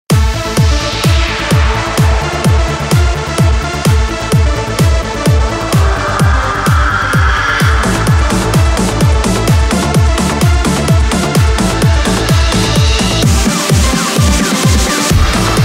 eurodance remix

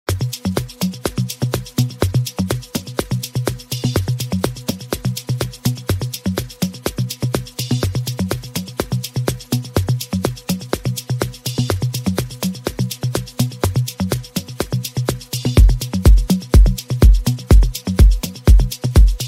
afro house remix

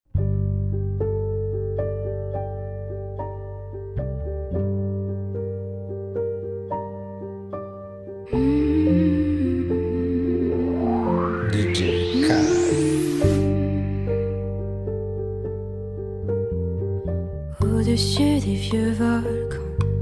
bachata remix

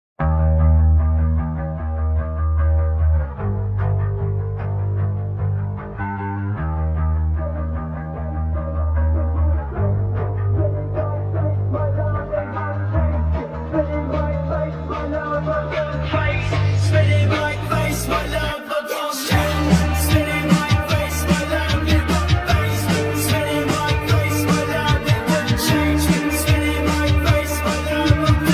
slowed reverb

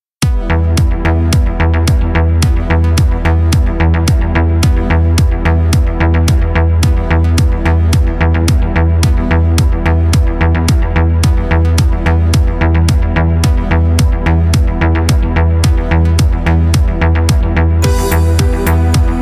Korg modern beat Korg Pa900; Sergey K

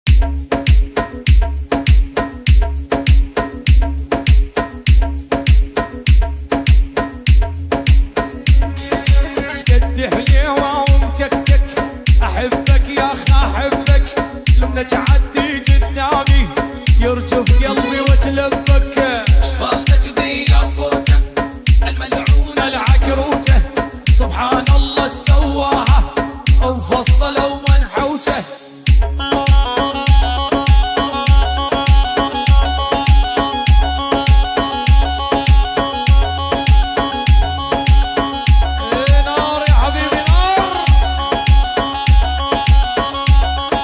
arabic moombah; Al Dabke

